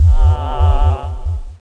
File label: fade